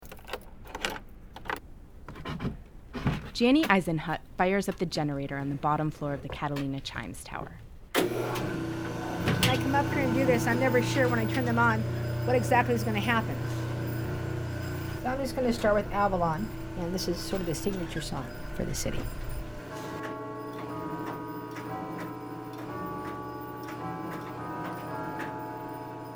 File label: the bells over avalon